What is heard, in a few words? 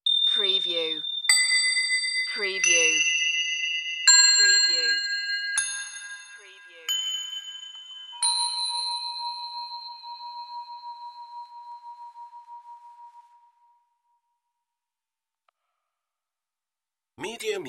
Bells Chinese Bell Tree Notes